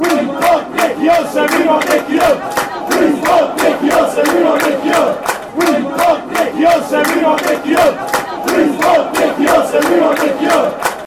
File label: we want, fanchants; nicky hunt